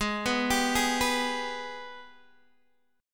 G sharp Minor Major 7th G sharp down Guitar DADGAD